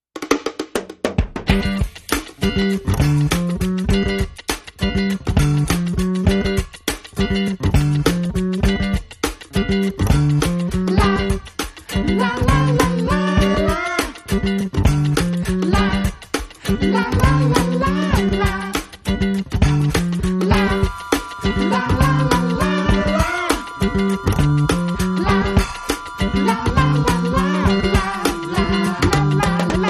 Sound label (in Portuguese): musica de brinquedo